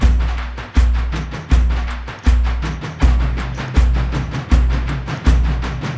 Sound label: AF TRANCE; Spectrasonics; Heart of Africa 2